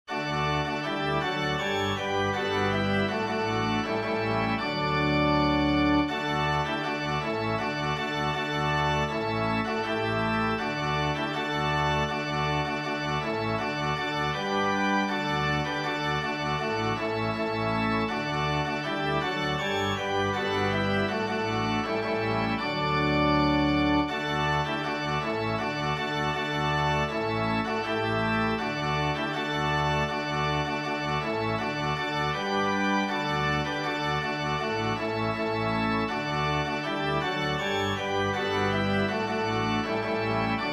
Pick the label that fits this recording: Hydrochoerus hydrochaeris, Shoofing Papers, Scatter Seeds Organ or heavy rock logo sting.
Scatter Seeds Organ